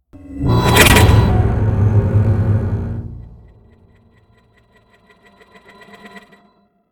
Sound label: Stingers; Outlast 2; PS4 Windows Xbox One